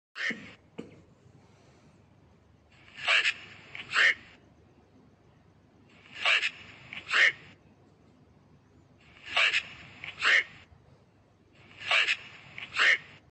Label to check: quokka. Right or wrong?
Right